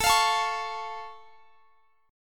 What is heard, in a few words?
Db Augmented, A